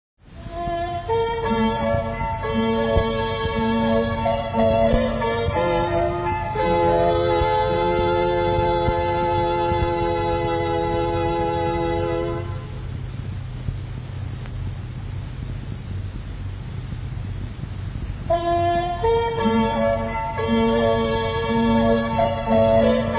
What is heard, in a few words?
interval signal; grc; yened rvd c1965